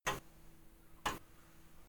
ticktack

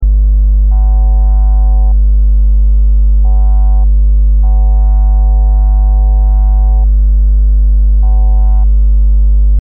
Netzspannung

